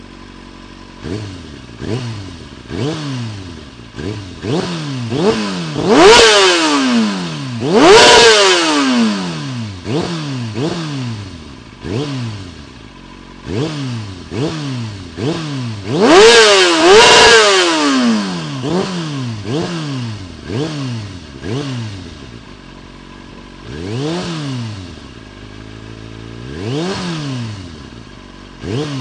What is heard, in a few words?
Kawasaki Ninja